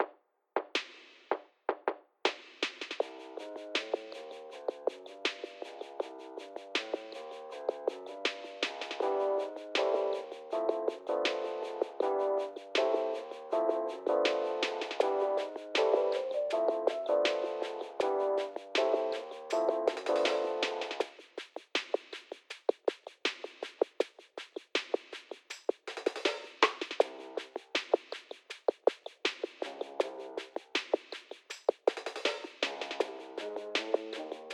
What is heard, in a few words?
Reception Room Melodic High Filter; DeadBirdStudios